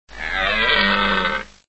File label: Deer Attack; Extra Lives